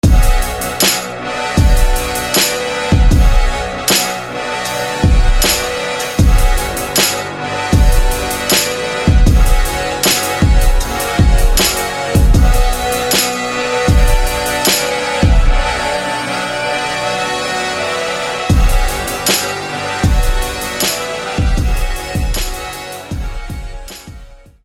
Brass Spoken

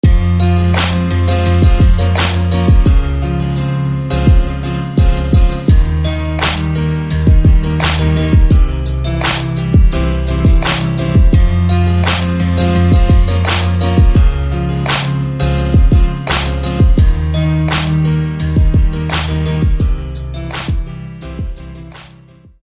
Keys; Gem Keys

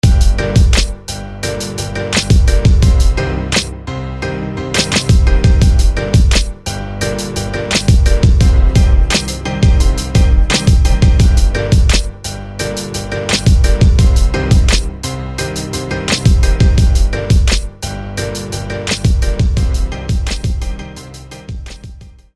Quintet Piano; SFB FX

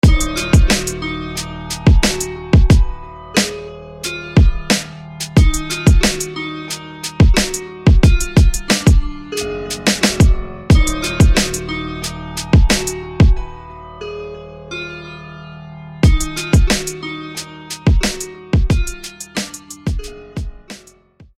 Cracked Piano

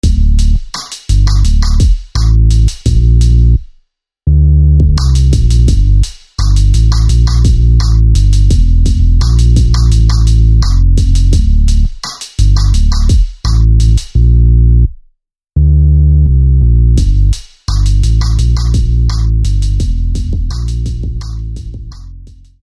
Crime Bass

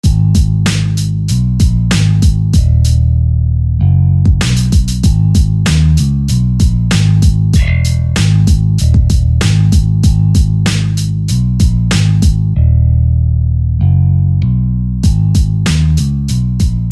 Bassman

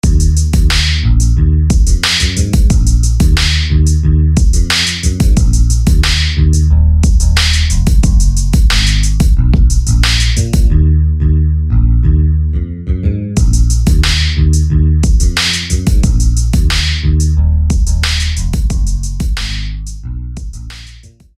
Blood Bass

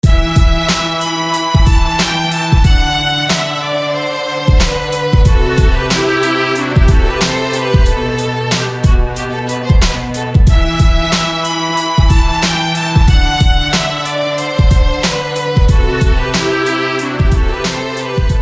Great Violin

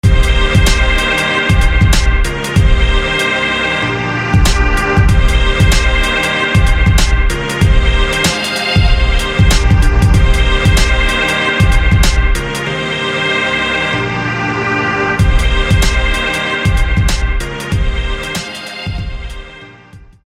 Link String Hit; SFB FX

